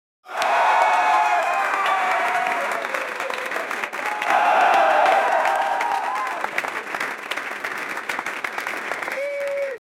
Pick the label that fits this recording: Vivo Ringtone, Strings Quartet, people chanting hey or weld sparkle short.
people chanting hey